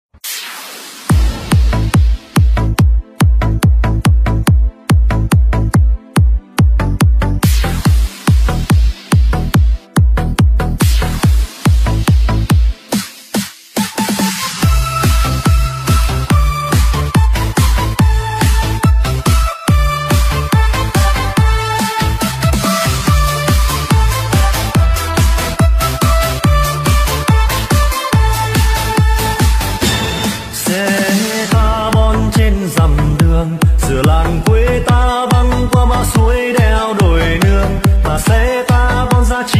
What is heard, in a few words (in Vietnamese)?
Remix Bass Căng Nát Loa Nhạc Đỏ Cách Mạng